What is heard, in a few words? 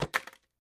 Hairbrash; Game rip; MiSide